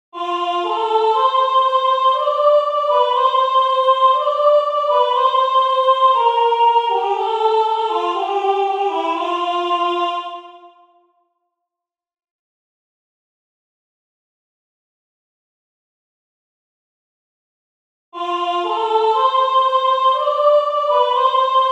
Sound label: rocking solo